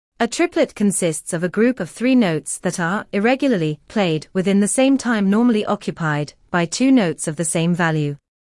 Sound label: a triplet